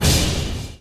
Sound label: ground swords appear; gg pure vessel